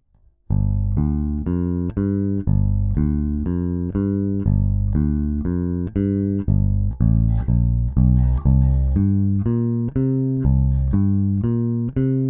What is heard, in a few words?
AER AMP One Two Three Compression light